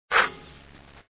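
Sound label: basketball swish; Dad 'n Me; bball swish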